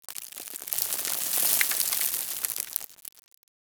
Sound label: debris